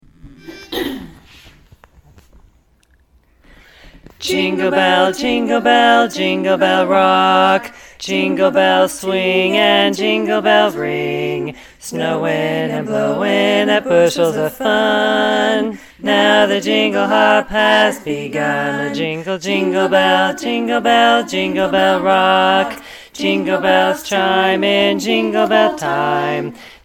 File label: rock bass